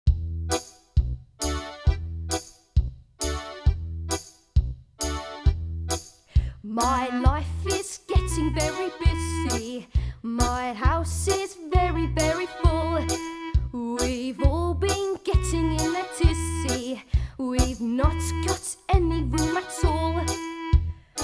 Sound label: choir